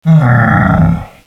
hmmm; DS; ML BOWSERS INSIDE

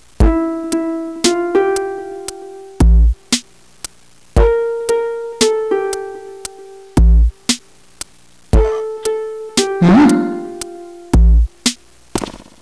Gallery music; GAMECUBE; LUIGISMANSION

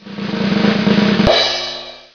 Drum Roll; PC; MARIOSFUNDAMENTALS

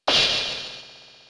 cymbols; SNES; mallow; SMRPG

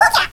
huja; DS; ML BOWSERS INSIDE; Fawful

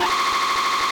skid; SNES; SMK

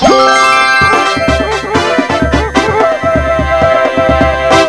shine get; GAMECUBE; SUPERMARIOSUNSHINE

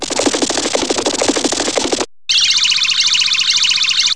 Cartoonish Sound; PC; MARIOTEACHESTYPING2

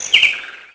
Birdy; DS; NEWSMB

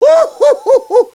ohhhohohoh; DS; ML BOWSERSINSIDE; Mario

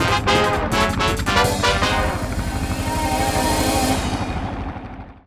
final lap; GAMECUBE; MKDD

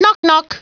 Knock Knock; Mario; PC; MARIOSFUNDAMENTALS

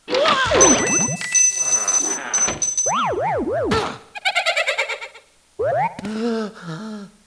fakedoor; GAMECUBE; LUIGISMANSION

